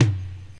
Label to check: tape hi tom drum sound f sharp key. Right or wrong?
Right